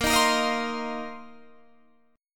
A sharp Suspended 2nd Suspended 4th, Guitar Standard; strummed; 18,18,20,20,18,20; capo=2